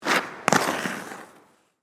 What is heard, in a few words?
Ice Skates Jump; Sports; Indoor, Ice, Rink; Ice Skating; BlastWave